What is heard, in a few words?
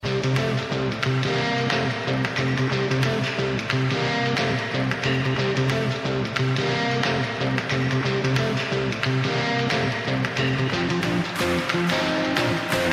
guitar outdoors